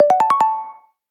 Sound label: charge alarm sound; AccuBattery